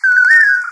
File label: toledo, chiroxiphia linearis; bosque seco